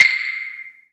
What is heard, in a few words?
Old Skool House Perc